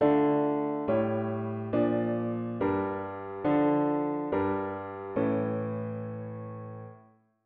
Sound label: root V7 bass